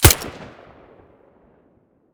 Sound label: silencer fiveseven mono, weapons